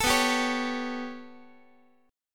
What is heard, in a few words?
B 7th Suspended 2nd Sharp 5th down Guitar, chord; B7sus2#5 strummed; x,x,12,9,11,12; JGuitar